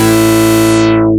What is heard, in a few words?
Synths A; vengeance essential club sounds-3